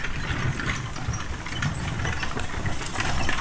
Horse Drawn Wagon; Electa Quinney

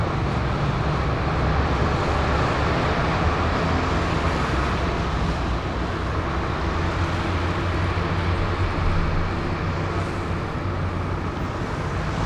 Traffic City Light Early; Poetry Project

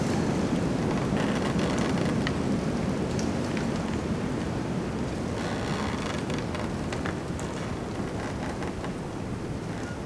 Wind, Heavy, Tree, Big, Creaks

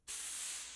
Air Brush Bursts Var Long; Electa Quinney

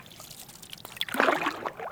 Canoe Paddle Light Paddling In Canoe; Electa Quinney

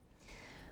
Birds

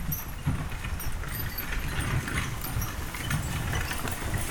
Horse Drawn Wagon By; Electa Quinney

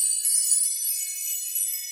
Magic chimes ringing; Electa Quinney

